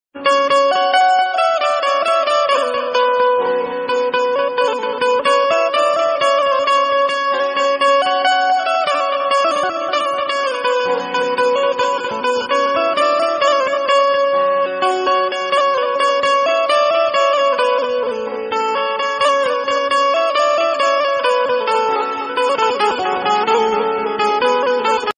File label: hindi ringtone, short